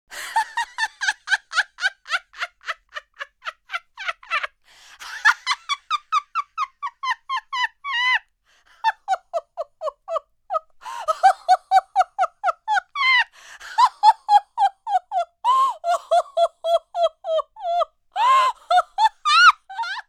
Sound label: laughing